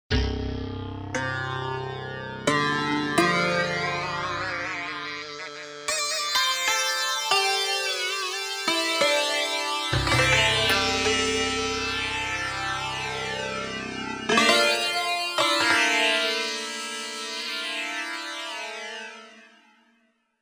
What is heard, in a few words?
Box Sitar, Korg MSC-1S; Korg MSC-1S Variety